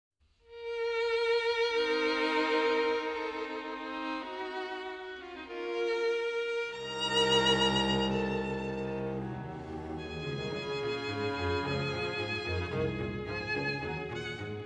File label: String Quartet in B-Flat Major, Andante con moto; String Quartet No. 13; Op. 130; Ludwig van Beethoven; Beethoven - String Quartets Nos. 1-16